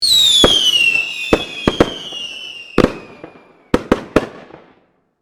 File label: fireworks